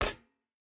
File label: footstep hide metal run; Rust